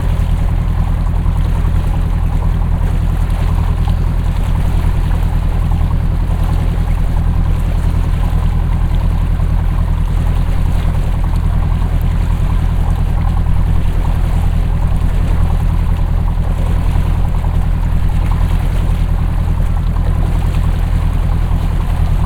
Lagan Motor Boat; Sound Scapes

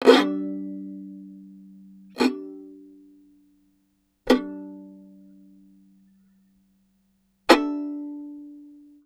Violin Extended Technique

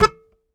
Very Short Note, Melodica

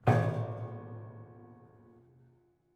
Prepared Piano

